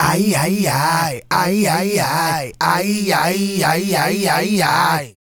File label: Male Group, Hip-Hop and RnB Vocals